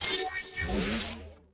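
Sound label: ui transition; Quantum Redshift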